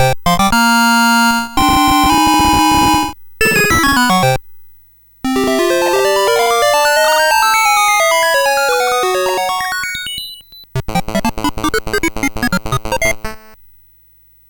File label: Musicbox, sid MOS6581R4